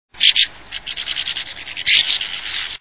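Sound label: sand martin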